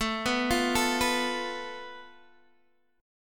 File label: A Suspended 2nd Sharp 5th, Guitar, Open D